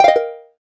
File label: gayageum star, keytone; SKY IM-A760S-VEGA-Racer